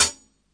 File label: ordinary closed hh; Hats; single hits